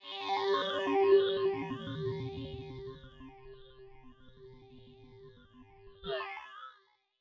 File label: 120 FX FM ambient G, Nu Jazz House; PowerFX